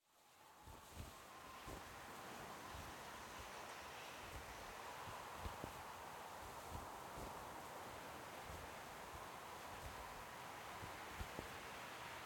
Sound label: Desert SFX, Flying; No Hermit